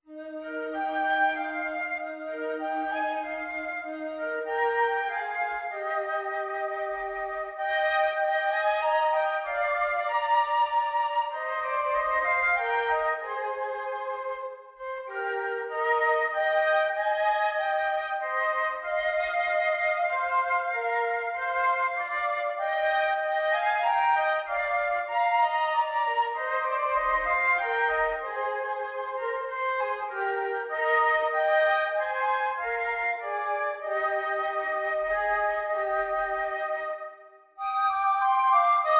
Flute DUET

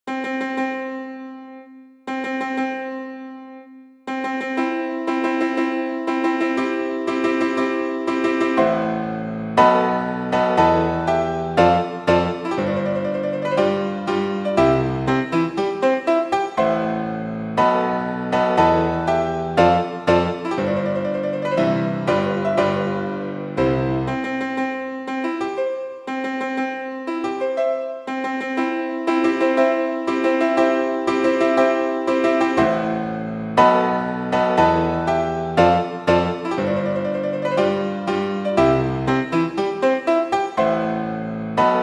Wedding March, Main Theme

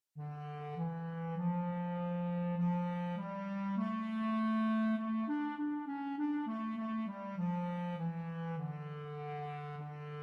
Clarinet Choir

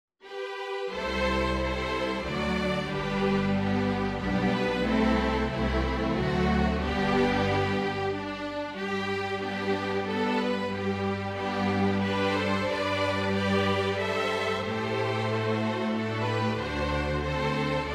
String Orchestra; Away in a Manger

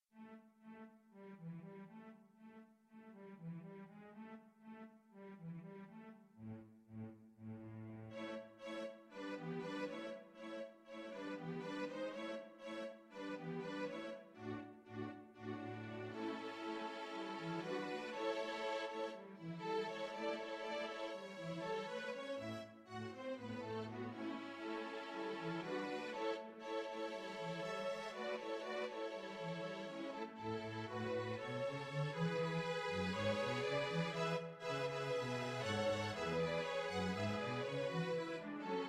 String, string quartet

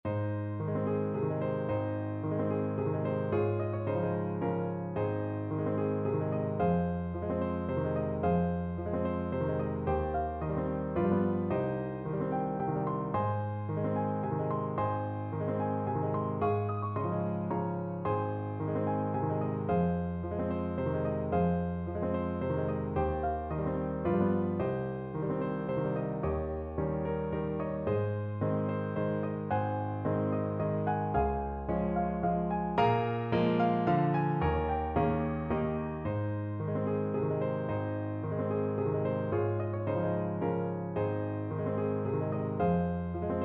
Waltz intermediate piano duet

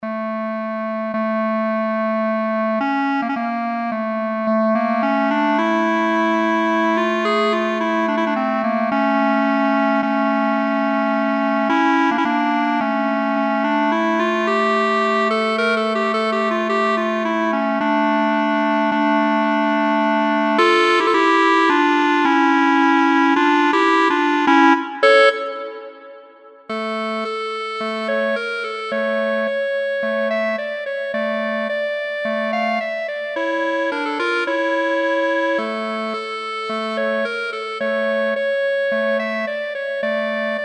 CL DUET, clarinet duet; Hava Nagila

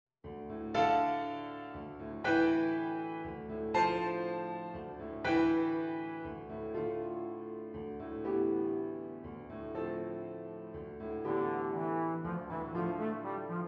Beautiful Little Gem trombone; bass clef or bb treble clef

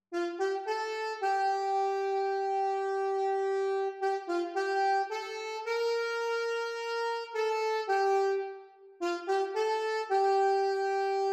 alto tenor sax duet; alto and tenor saxophone duet

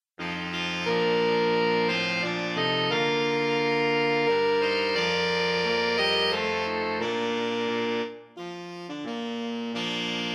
sax quintet; saxophone ensemble; STAR SPANGLED BANNER

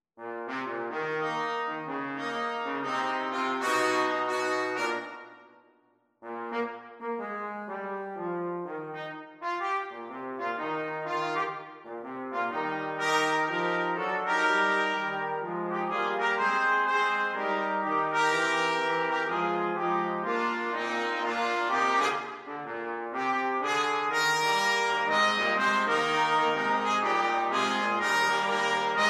brass, brass quartet; here we come a wassailing

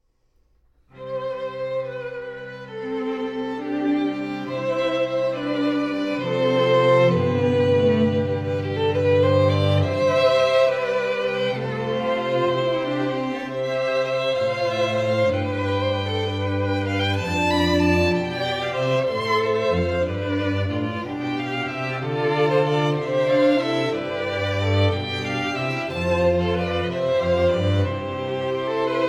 string quartet

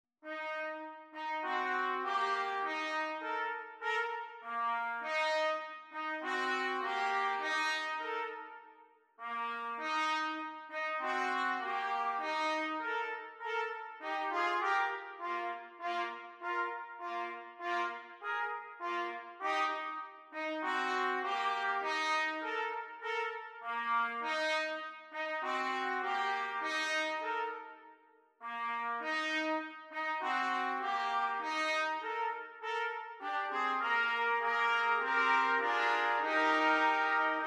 Trumpets quartet; Tricky Trumpets

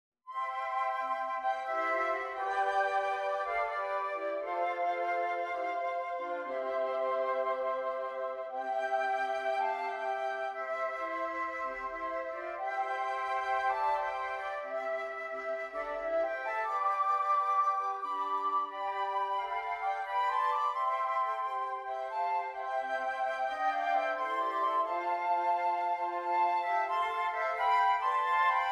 Jazz FL, flute quartet; Silent Night

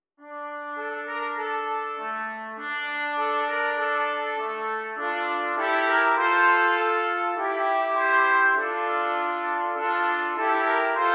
trumpet trio

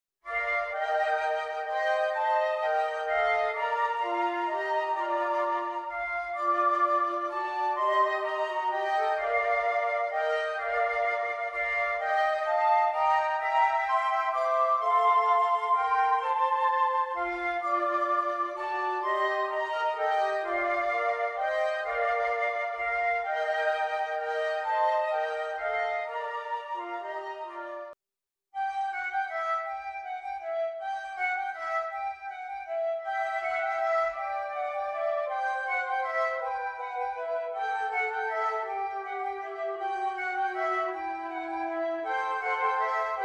FLUTE TRIO

